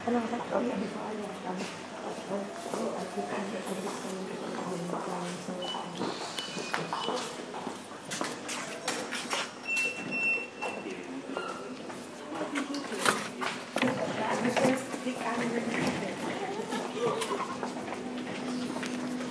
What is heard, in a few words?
disembarking from train